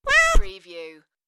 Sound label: Meow; beanstalk audio